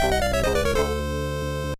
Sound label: Jingle; Hamster Paradise Pure Heart; gba